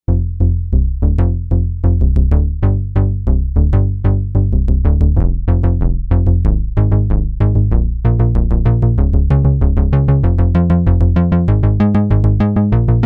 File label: Sequencer Bass, Novation UltraNova; Factory Patches